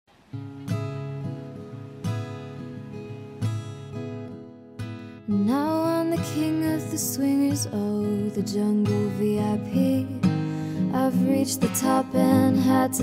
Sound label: Acoustic Cover; The Jungle Book